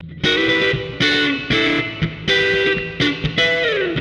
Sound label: Guitar wet; Nimbus Station; lego universe macos windows gamerip 2010